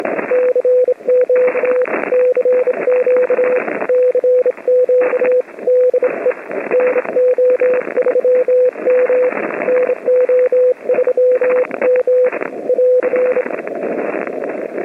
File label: 1930 transmitter 160m